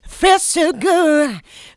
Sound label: Female Vocals; Houseworx